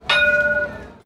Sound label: tram bell